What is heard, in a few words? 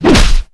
claw strike; utah